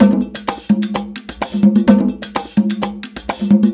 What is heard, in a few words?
Latin House Loop 128 BPM; Vengeance Samples